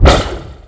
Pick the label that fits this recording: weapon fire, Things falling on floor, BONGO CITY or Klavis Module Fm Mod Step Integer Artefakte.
weapon fire